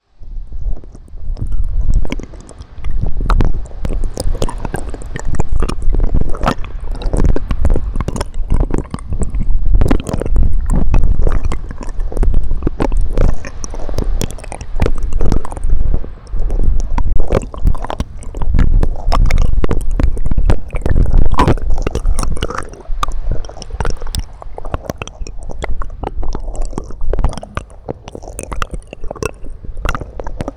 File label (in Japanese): 両耳奥舐め